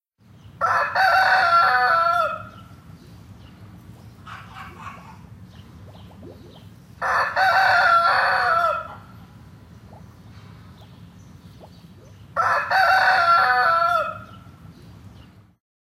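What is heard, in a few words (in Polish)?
odgłos zwierząt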